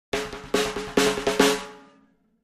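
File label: Drums Snare Comedy Accent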